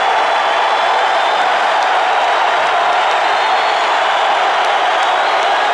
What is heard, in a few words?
BBall Crowd Cheer Loop; SFX Kit; Crowds